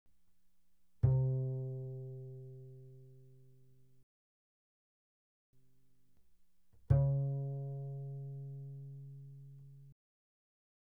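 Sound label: Bass pizzicato fortissimo sul G C3 B3, doublebass; Strings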